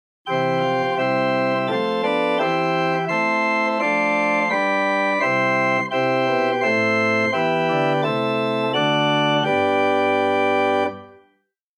Bw Gedackt Rohrflote Prinzipal; Grace Lutheran Champaign

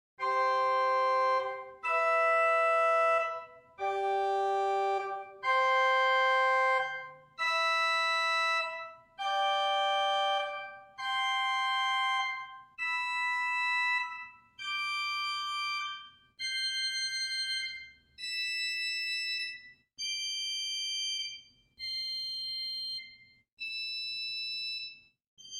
Gt Mixture arp, organstops

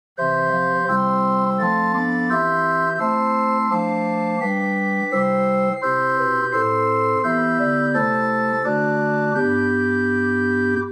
Hohlflote Flote, organ; Grace Lutheran Champaign

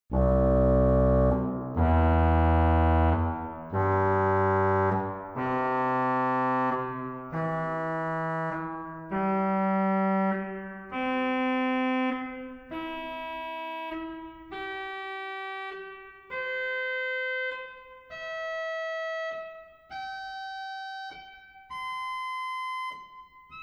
Trompette; CavColMainz

